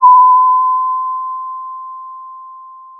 pop, Sound of a school bell

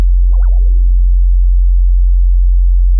Sound of an owl

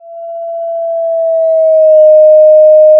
Sound of a police car siren

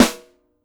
Snr, drum

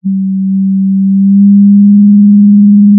pop, Sound of a cruise ship horn

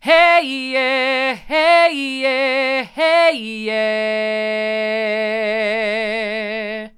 hey hard, vocal

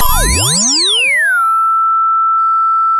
pop, sound of a bike bell

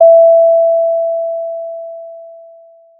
pop, Sound of a doorbell

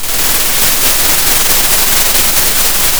pop, Sound of a thunderstorm

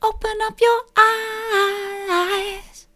open up your eyes soft, vocal

